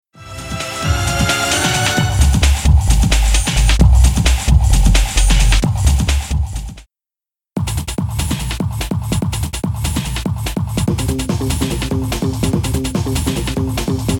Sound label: Beat